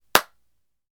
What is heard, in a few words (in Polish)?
klaps, w teatrze; w teatrze i kinie; pomoce dodaktyczne; karty obrazkowe